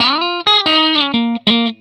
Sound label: RIFF 120, Vintage Blues Guitar